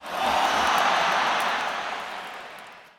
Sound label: people stadium cheer